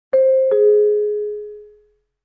pinpon; anemoi

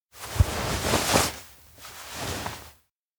kigurumi pass; anemoi

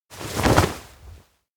hug; awayuki; anemoi パッケージ版 1.1

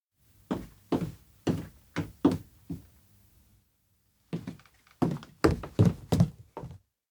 steps indoor scurry; awayuki; anemoi パッケージ版 1.1

